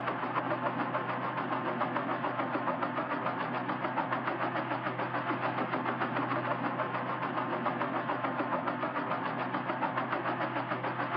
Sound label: Drone Flying; unsighted